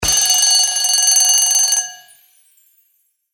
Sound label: prize pick trigger bell; My Bingo Hall